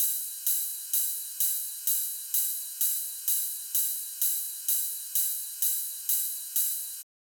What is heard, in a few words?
Big EDM Drum Loop Ride loop, 128 BPM; Future House; Future House Tools